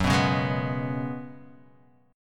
F sharp Suspended 2nd Suspended 4th, F sharp, chord; x,9,9,6,8,6,x,x; down